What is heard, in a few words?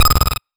Stutter Blip, 8-Bit; 8-Bit Kit Volume1